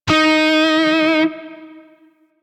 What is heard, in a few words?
Grineer Shawzin Flourish Oct Three D Sharp; Music; DE; Warframe